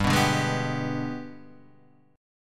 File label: G sharp Minor Major 7th Flat 5th